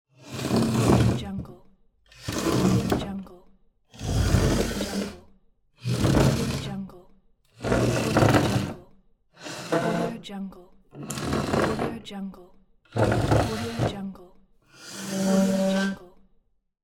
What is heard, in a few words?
furniture move